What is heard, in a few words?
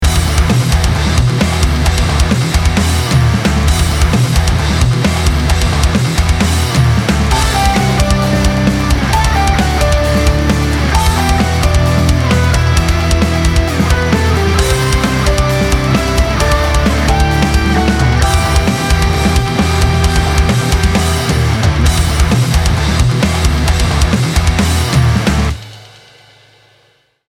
Emotional Rock 132